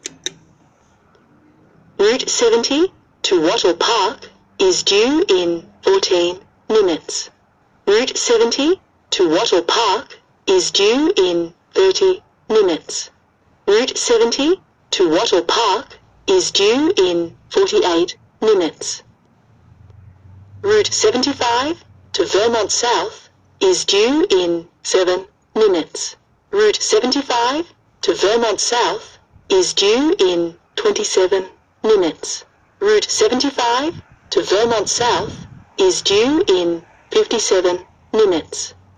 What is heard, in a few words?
tram info button